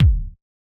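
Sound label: Bass Drum Analog; Yamaha DJX-2B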